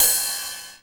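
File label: 909 ride, TR-909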